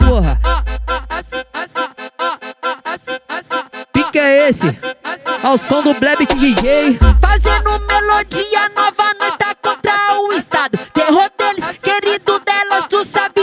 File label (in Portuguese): funk sertanejo